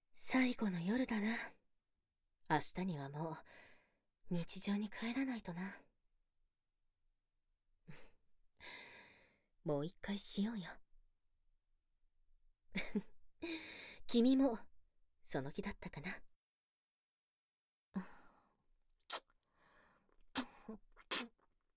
耳舐め激しめエッチ【おほ声】; 三日目夜

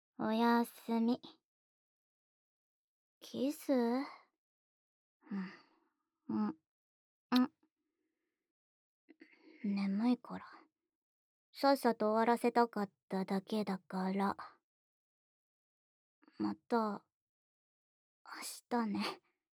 おやすみのチュー

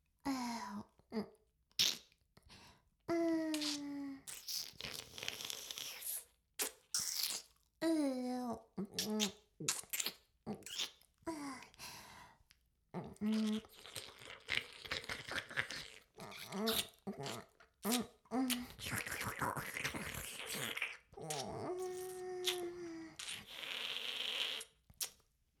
フェラ音声, ASMR; 魔法少女マスカリマッスル

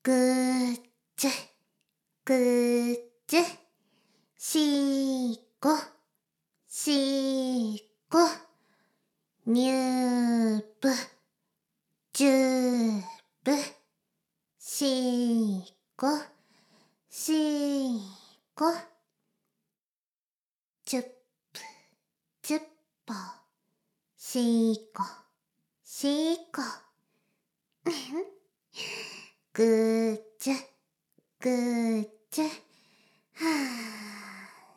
しこしこ 魔法少女, ASMR; 魔法少女マスカリマッスル

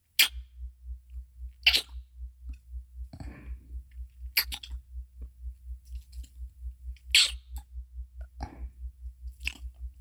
乳首弄りループ音 ちゅぱ…ちゅぱ…

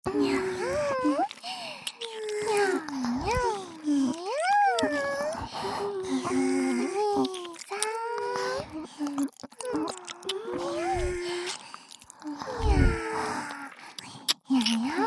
にゃんにゃん甘え 耳キス; 美月＆美星のWにゃんにゃん甘え＋W耳キス

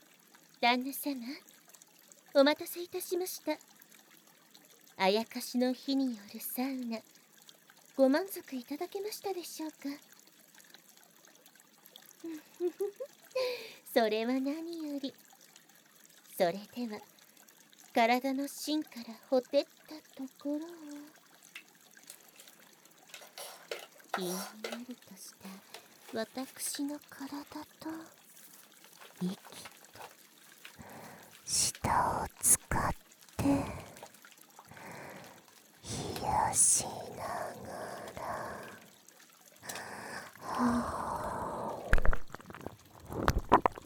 濃厚耳舐め+泡手コキ 射精後もねっとりあわあわお掃除フェラ（動き回る耳舐め 効果音あり 環境音）; ASMR

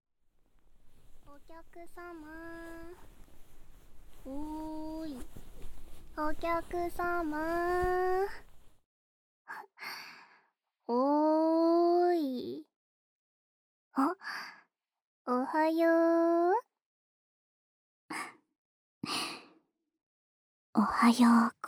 耳かき Ｗ梵天 Ｗ耳吹き

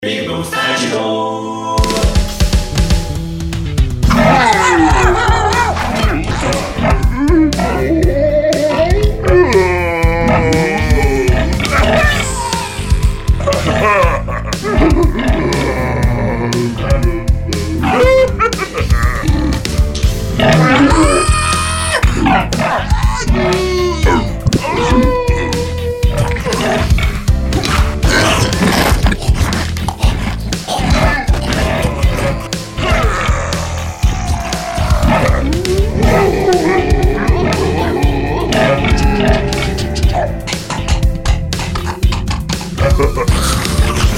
怪物 オーク; ぴぐみょんスタジオ作品紹介(効果音素材集); ぴぐみょん効果音素材集

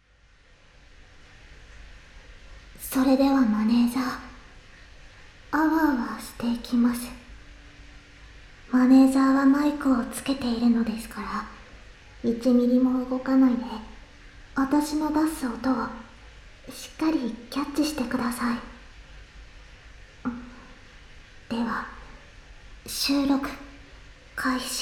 お風呂場でASMR録音、泡; 反転; アノ娘と温泉旅行編

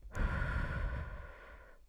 R 寝息強すぎてむしろ耳ふー

